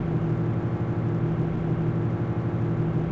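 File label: conveyor Belt loop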